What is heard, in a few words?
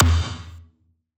Perc; Sample Banks; Percussion